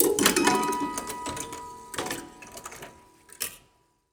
Prepared Piano; Battery 3 Sample Kits

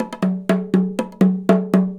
120 TIMBAL, Indian Traditions; USB Soundscan vol.45; AKAI

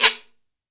GUIRO; AKAI S6000 CD-ROM - Volume 5; GM PERCUSSION KIT 2

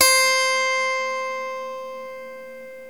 SWEDISH LY, Swedish Lyre; E-MU Producer Series Vol. 2 – More Studio Essentials; Composer

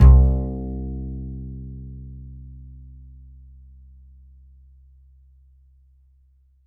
A, Upright Acoustic Bass; 1ST POSITION; Larry Seyer

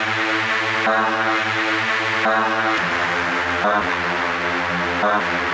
Hutter Melody, Drum n Bass, Synth; KJ Sawka; Drum n Bass & Beyond

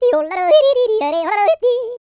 YODEL; ILIO; Vocal Planet

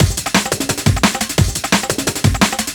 DNB BREAK

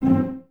CES C 4 Pizz, Cellos, String Ensembles; Miroslav Vitous

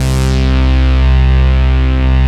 PONNICS, SYNTHS; Pro-Rec - Synthsonic

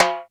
HI TIMBALE, D110 DRUMS; Crypton - DrumBase5000

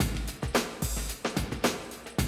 Classic DrumLoops, 110

